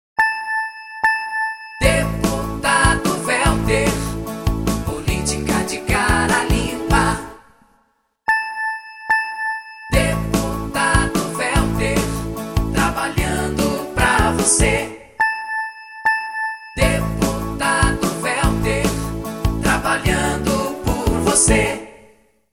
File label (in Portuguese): vinheta